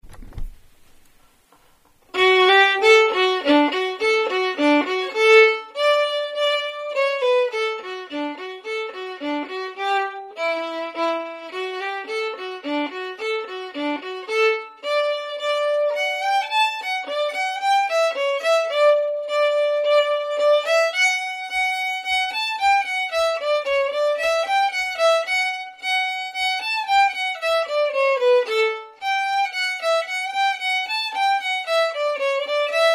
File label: Soldiers Joy Melody slow, violin; duets for violin only